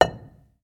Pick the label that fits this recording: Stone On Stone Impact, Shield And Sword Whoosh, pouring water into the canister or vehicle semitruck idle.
Stone On Stone Impact